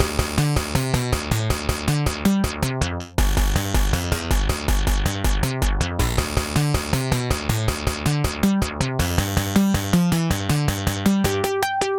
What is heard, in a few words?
Retro Between Levels Loop; Retro Victory, Defeat and Briefing (Mixed Systems)